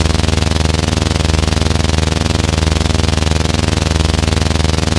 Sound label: engine Circular; Kenney's Sound Pack; Sci-Fi Sounds